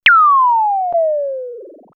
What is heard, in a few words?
Small Metorite, synth fx; StarTrek; X-Static Goldmine